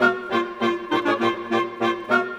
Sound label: Winds, Orchestral; Sonic Foundry (Sony Creative Software); Orchestral VOL-2; Modern 06